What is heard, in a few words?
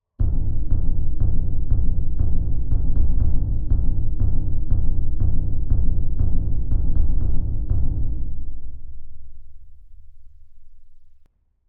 Bass Drum Sound